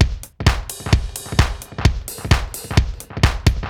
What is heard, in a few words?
Space Echo HR-16B 130BPM